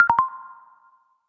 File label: Short Notification